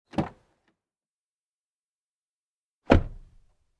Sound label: car door